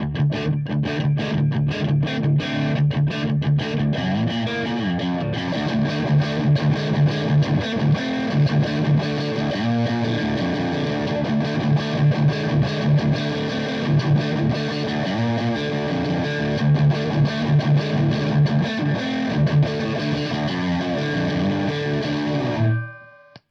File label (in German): Verzerrer Pedale Tube Screamer Gain Channel; Plumes